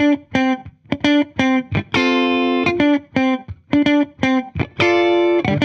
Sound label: 70s Strat, Guitar, 85bpm, D, dusty funk; musicradar